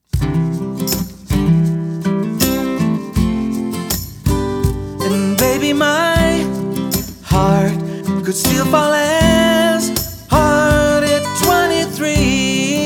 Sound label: w perc